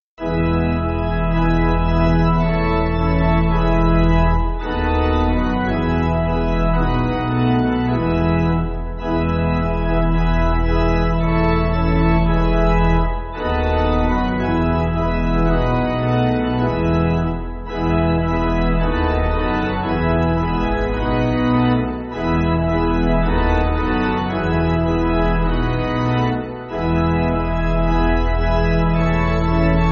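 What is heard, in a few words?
Pipe, Pipe Organ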